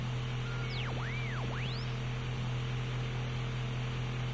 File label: glider radio; ambience; Source Engine; dod